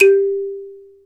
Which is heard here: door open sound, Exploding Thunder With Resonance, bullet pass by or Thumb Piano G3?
Thumb Piano G3